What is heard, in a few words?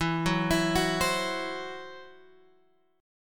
E Suspended 2nd Sharp 5th, Guitar, Open G; 14,11,14,11,13,x